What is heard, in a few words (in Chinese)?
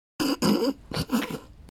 憋笑; 笑声集合